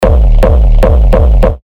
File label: Kick C#; Quarry; terra kicks; Revealed Terra Kicks